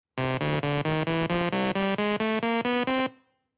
sydney scale, the worlds first music on a computer; csirac; Australia